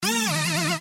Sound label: error